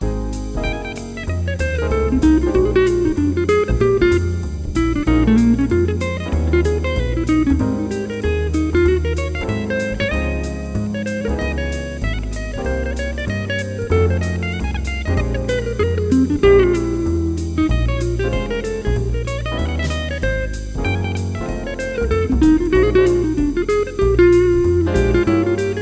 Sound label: 190bpm strato; kaula; gt2